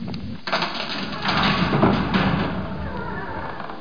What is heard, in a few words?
DOOR OPEN